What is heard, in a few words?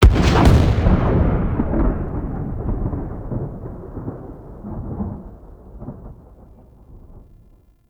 Archwing Space Explosion Mid; Warframe